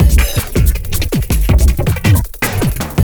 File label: JUNGL 160, JUNGLE LOOP